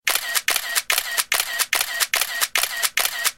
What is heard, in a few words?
Photo Camera